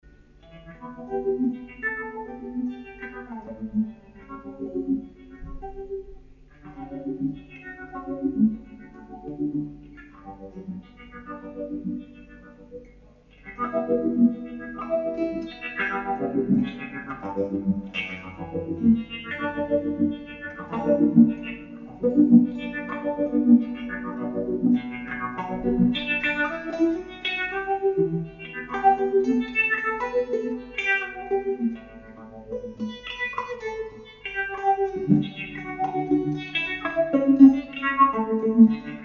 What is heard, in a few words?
moog